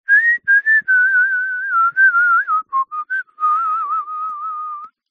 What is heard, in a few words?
human whistle mouth short song; zapsplat